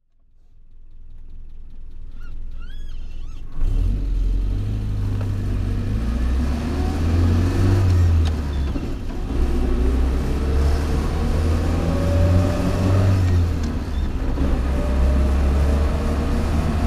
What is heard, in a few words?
landrover 88 petrol driving, Engine sound driving; Land Rover 88 soft top, 1975; V3D/ ClassicarImages; Marc Vorgers